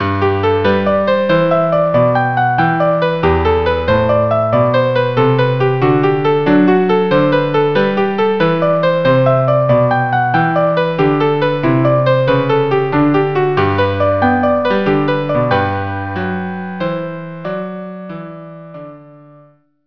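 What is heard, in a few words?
violin cello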